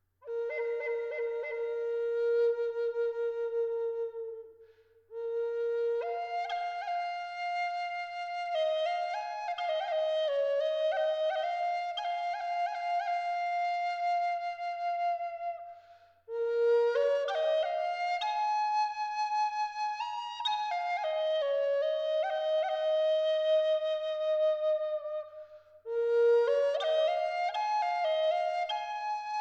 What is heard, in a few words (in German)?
Ahorn Zwetschge B mittel 432 Hz; Wiesel